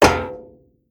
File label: Metal Run